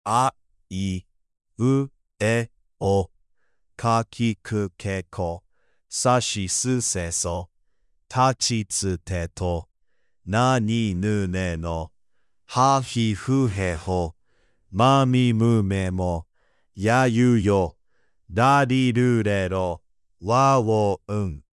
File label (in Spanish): sonidos puros